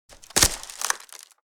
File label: Hamper set down soft impact; basket